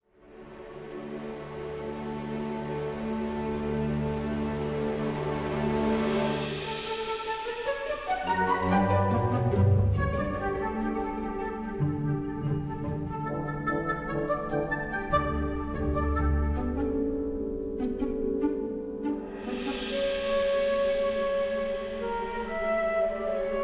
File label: World Music; Jurassic World Evolution 2; PS4 PS5 Windows Xbox One Xbox Series X/S